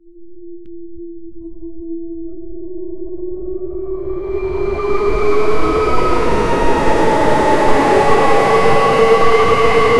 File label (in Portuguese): ao estilo filme de terror